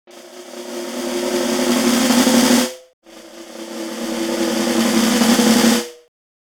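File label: drums; Smeshariki Cartoon Studio; Barabani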